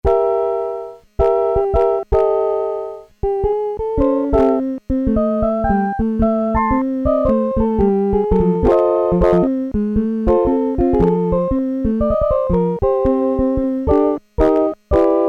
electric piano, Casio SK-1, Harmonic component sound examples